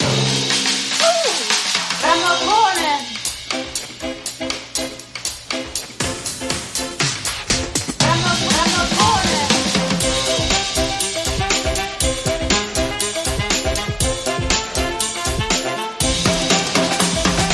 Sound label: Horns